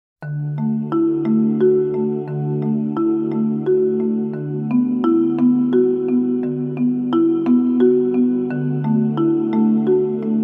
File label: Double Drums; Steps in the snow